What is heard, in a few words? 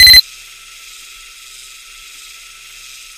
sentry spot; tf